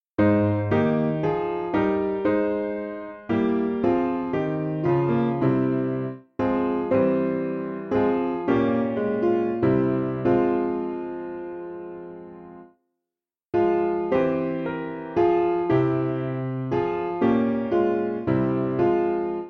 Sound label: O Thou In All Crowle Piano; Simple Piano